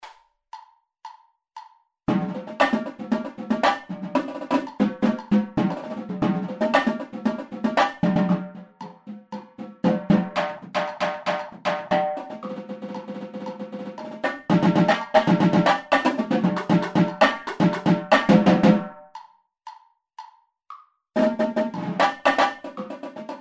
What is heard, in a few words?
Tenors Etude, Marching Band; Liberty University Marching Band; Liberty University Bands